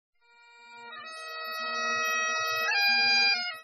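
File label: bagpipe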